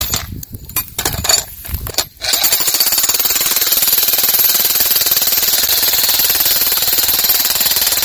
Sonido Martillo demoledor

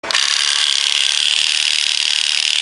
Sonido sierras caladoras

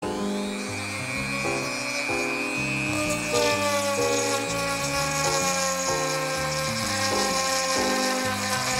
Herramienta Rotativa

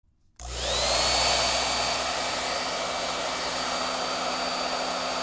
Pistola de calor

